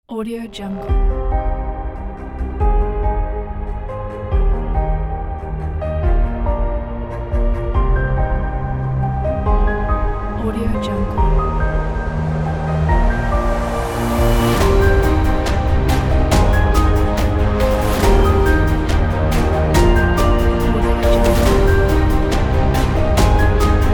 trailer cinematic

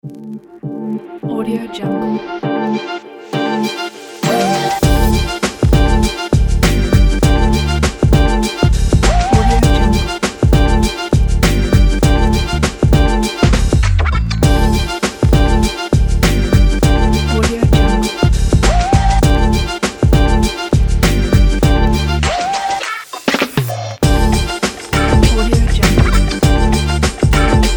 hiphop motivational

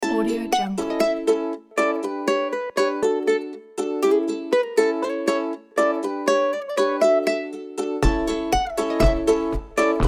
happy quirky rustic indie folk

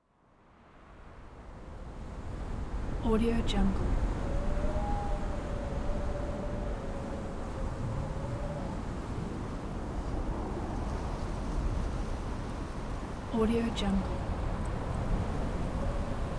winter wind

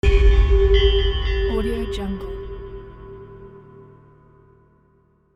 dark epic bell

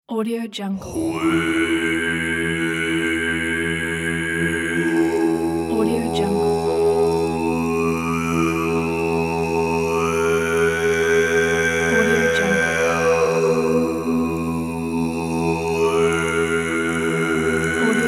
throat singing

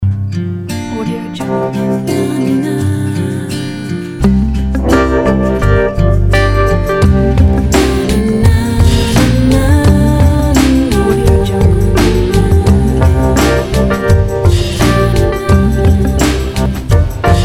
sensual female vocal commercial

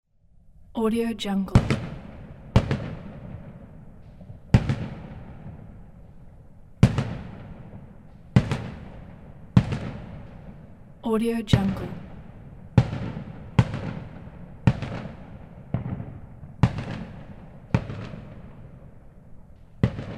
firework distant

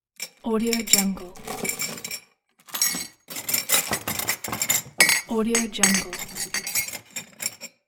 metal and glass objects

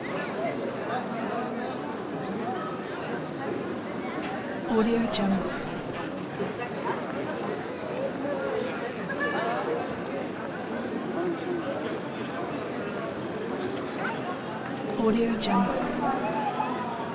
pedestrian street walla

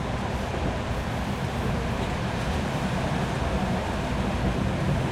old italian diesel train interior moving loop

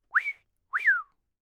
whistle wolf; prosoundfx

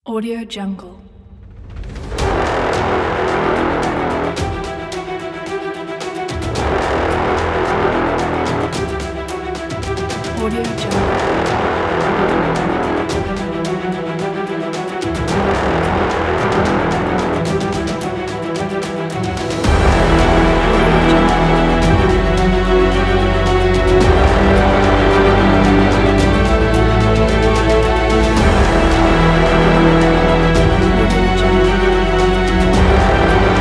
hollywood trailer

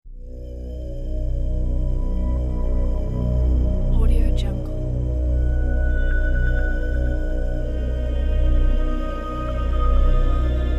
ambient suspense documentary drone background; music2noise

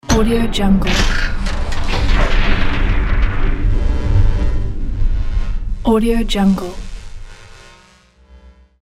futuristic robot activation

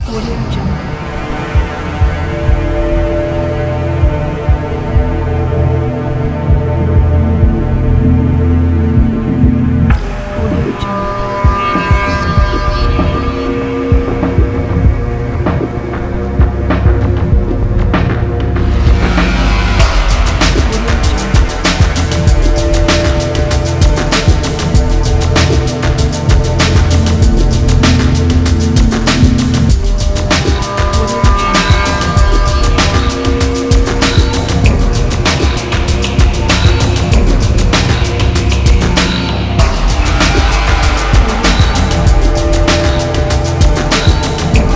cyberpunk ambient

